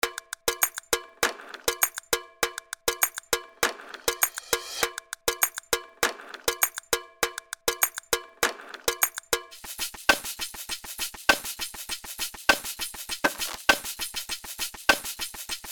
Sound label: Organic Percussion Samples & Loops